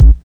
rich lows bass drum one shot a key, kick sound tuned to the A note; kick drum samples